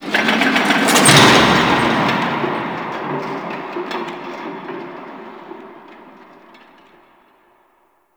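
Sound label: Shipyards Distant Machine, Ambience; Warframe; Grineer Shipyards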